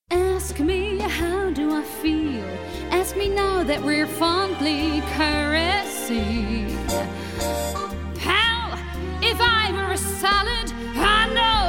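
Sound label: Singing, English; GoLocalise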